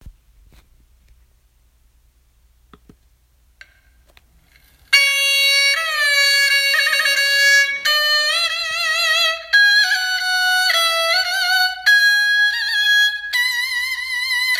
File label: jinghu strings